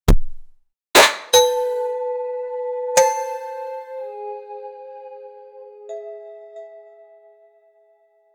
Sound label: shot sound, videogame; in an indie